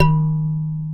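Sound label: D#2 kalimba left; WorldSounds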